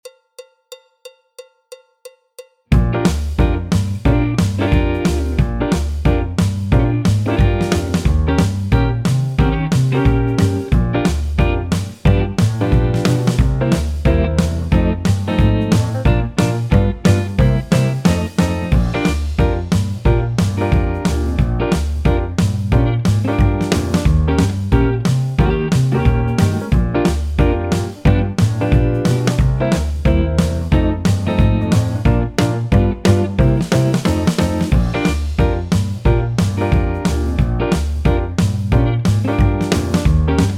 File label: Wardrobe Blues BT, tenor, clarinet, flute, trumpet; Jazz Syllabus Level 2